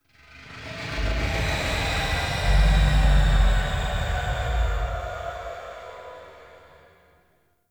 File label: Exhale Interior Small, Ambience; Warframe; Sentient Lair